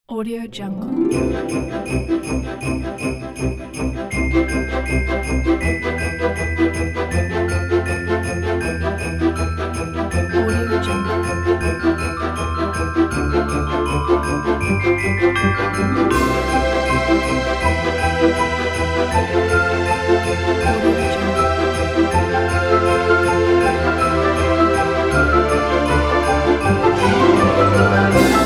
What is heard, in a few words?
santa sleigh bells orchestral